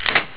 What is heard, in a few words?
load clip